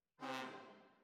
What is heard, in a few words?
Tuba, jazz for piano